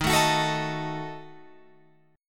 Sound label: Eb Diminished, Guitar, Open F; Ebdim strummed